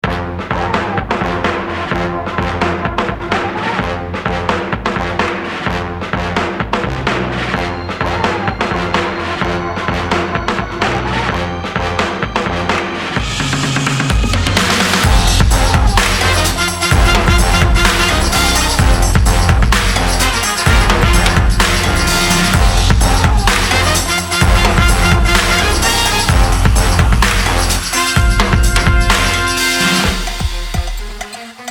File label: Hard Funk No Vox; funky